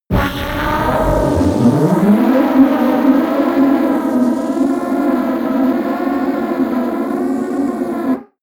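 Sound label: rumble storm long, science fiction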